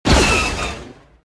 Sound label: fire, weapons; atst